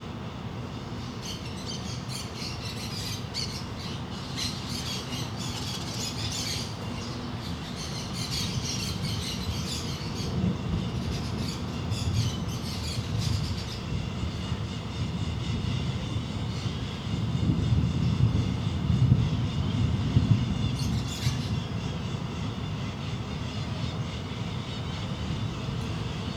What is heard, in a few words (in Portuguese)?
Trovao grave com muitos passaros e transito longe